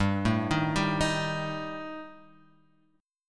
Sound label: G Suspended 2nd Sharp 5th G